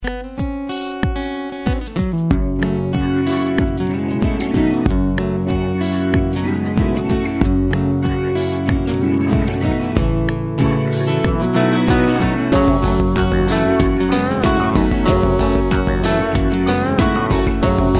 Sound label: Electro Blues